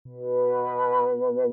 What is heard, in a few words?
tone